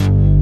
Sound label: PHAT SYN BASS; Pro-Rec - Synthsonic; BASSES